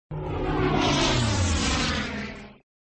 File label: Sound Plane flight; Ramps